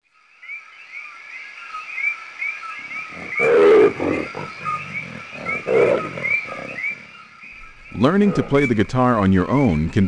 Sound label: guitar; guitai